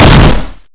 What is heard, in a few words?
mc51 fire, weapons; firearms